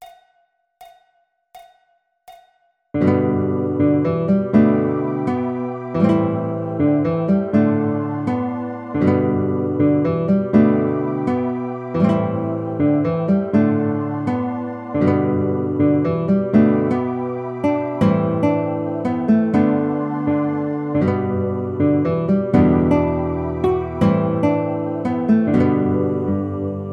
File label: Christmas Is Guitar; holidays